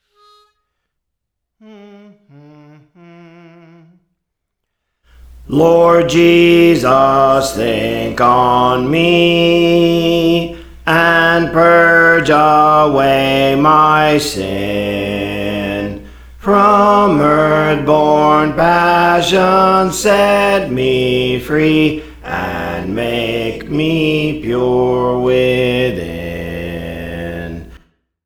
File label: Bass, Songs; Praise For The Lord Training; Lord Jesus Think on Me